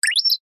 science fiction robot chirp beep very short slightly cartoonish